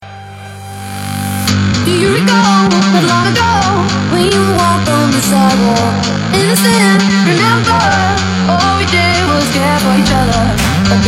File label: Electro